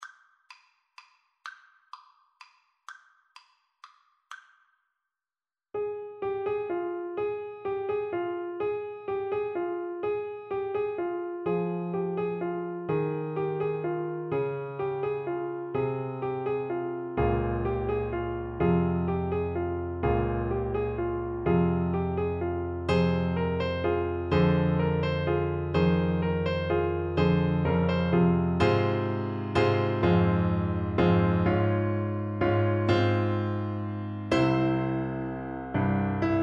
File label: Baritone Saxophone